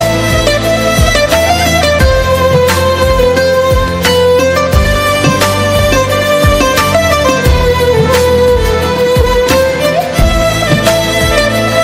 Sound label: Instrumental